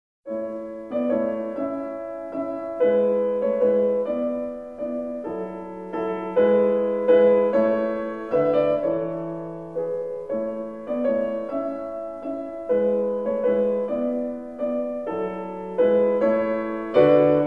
piano sonata no 11 in a major k 331 andante grandioso; mozart